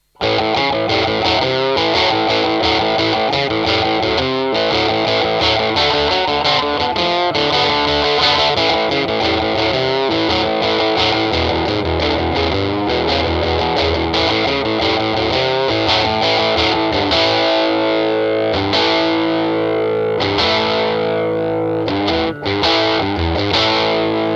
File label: 5W crunch; lampes; british blue